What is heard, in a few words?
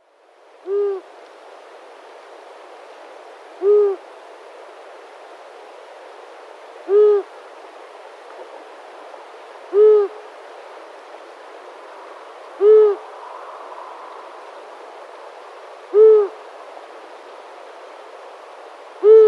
Long-eared Owl male display